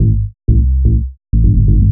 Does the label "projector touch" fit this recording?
No